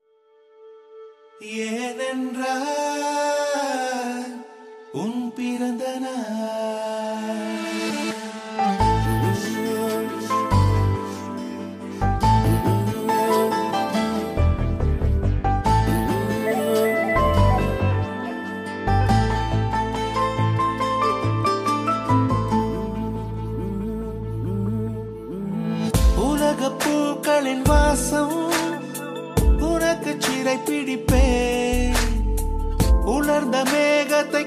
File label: tamil birthday song